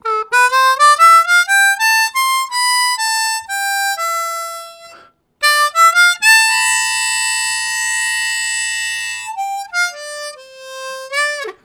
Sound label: Upstairs, Harmonica Riffs; Zero G